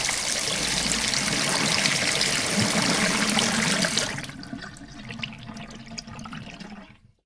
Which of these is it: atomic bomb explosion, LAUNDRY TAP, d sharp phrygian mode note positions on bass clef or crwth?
LAUNDRY TAP